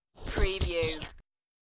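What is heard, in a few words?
Blood Squirt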